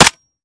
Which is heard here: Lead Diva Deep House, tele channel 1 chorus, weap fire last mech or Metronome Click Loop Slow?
weap fire last mech